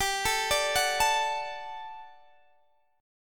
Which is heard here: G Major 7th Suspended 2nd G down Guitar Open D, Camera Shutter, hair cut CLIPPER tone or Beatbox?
G Major 7th Suspended 2nd G down Guitar Open D